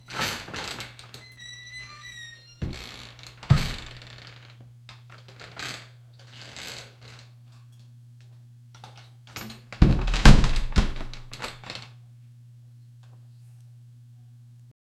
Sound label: a door slowly creaks open